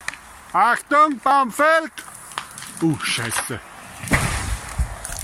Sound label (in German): baum fallt